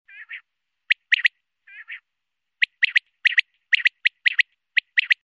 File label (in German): wachtel